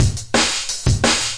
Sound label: 174 bpm tekstep, drum loop; 161 to 180 bpm